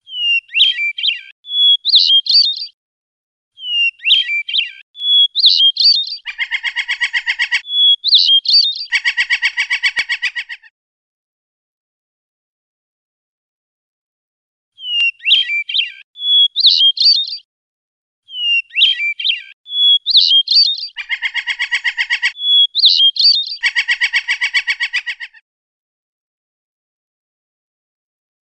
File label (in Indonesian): pancingan ngerol kacer; kreasi om boedi prasetyo